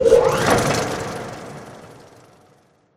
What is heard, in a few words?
wheel deploy layer; weapons; dota; ringmaster